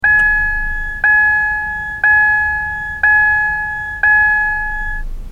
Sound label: Mondeo Seatbelt Alert Inside